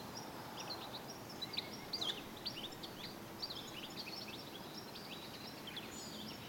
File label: Carduelis carduelis; SETE